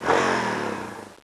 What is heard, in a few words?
bike sound